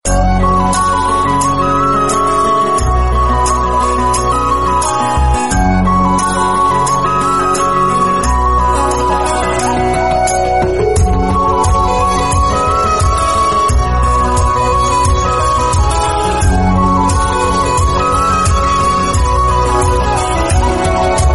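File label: bollywood romantic flute music tone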